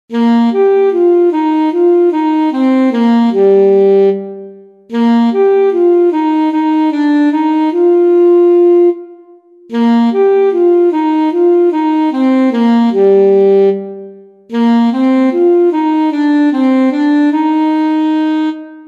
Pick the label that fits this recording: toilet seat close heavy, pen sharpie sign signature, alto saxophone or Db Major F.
alto saxophone